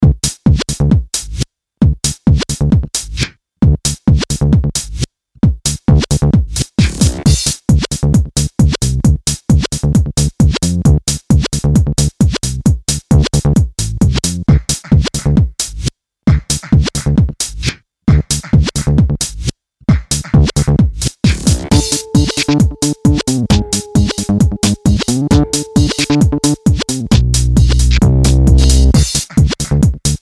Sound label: MPC-500